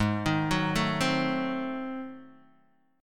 Ab 7th Flat 9th down Guitar Open D

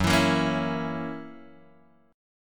F sharp 7th, guitar; F#7 Chord {2 X 2 3 2 0} strummed

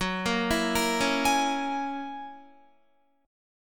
F sharp 9th F sharp Guitar; F#9 Chord; arpeggiated; 13,13,16,15,13,16

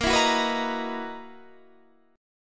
F sharp 13th A sharp

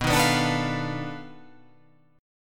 B 7th Sharp 9th Flat 5th, down

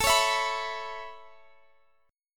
A Major Add 9th A Guitar Standard, chord; Aadd9; x,x,19,18,17,19; strummed; capo=2

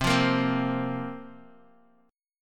C 7th Suspended 4th, guitar; C7sus4 strummed

